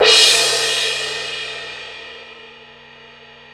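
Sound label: Suspended Cymbal Open ff; Digital Sound Factory Virtuoso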